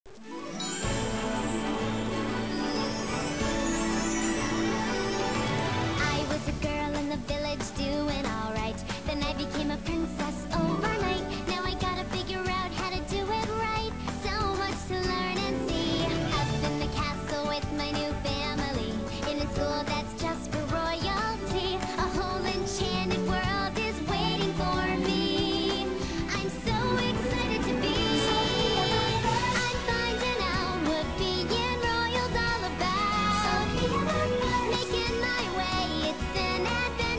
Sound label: ringtone